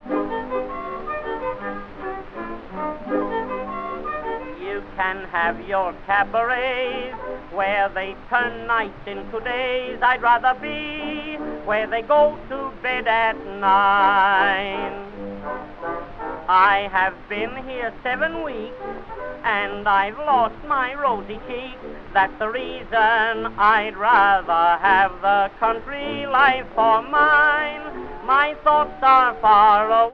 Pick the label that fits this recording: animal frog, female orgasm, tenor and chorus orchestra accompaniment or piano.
tenor and chorus orchestra accompaniment